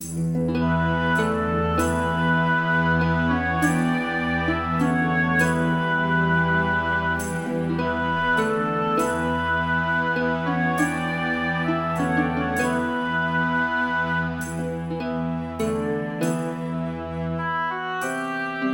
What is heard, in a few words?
Luteplay; anno 1503 gamerip